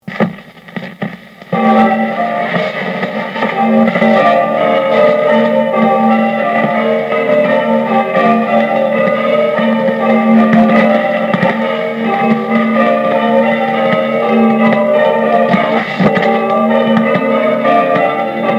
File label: Plain Course Grandsire, bells